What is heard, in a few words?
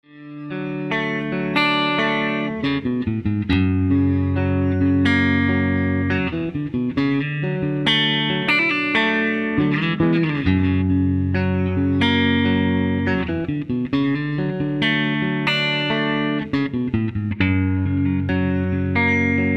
Intro Guitar